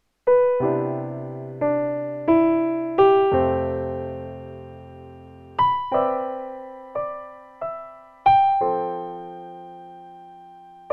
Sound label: Piano; Alesis Recital Pro